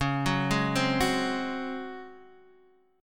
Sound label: Db Major 7th Suspended 4th Db down Guitar Half Step Down